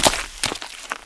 doskok; Mafie 1

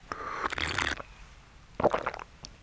srknuti; Mafie 1

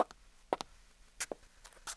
preslap zenska; Mafie 1

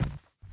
pult; Mafie 1

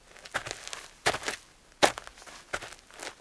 kroky; Mafie 1

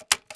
otevirani trez; Mafie 1

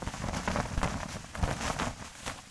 drap; Mafie 1

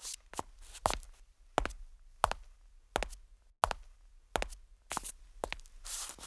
kroky; Mafie 1

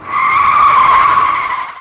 smykovani; Mafie 1

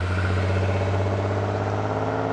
prujezd; Mafie 1

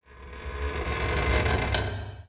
dvere; Mafie 1

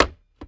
sluchatko; Mafie 1

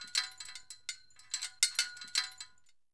zámek; Mafie 1

